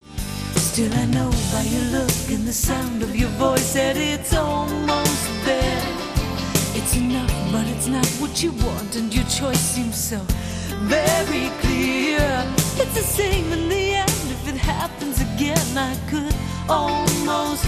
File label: acoust